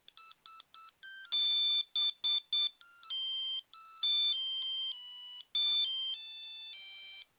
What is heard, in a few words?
ericsson t39 ringtones